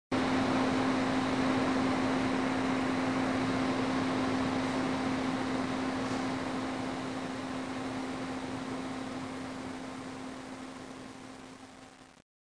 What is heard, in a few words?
COMP FAN